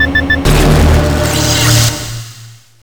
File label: displacer teleport self; weapons